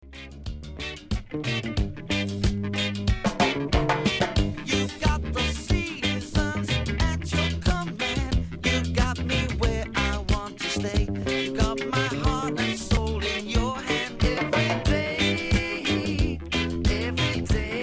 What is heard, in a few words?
Reggae